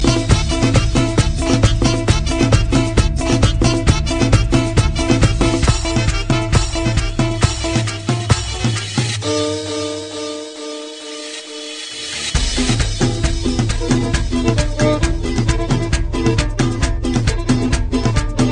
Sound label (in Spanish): Mix De Cumbias